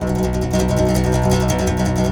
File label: C. Bass Balalaika, Plucked Strings